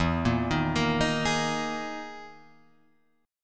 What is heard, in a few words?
E Major 7th Flat 5th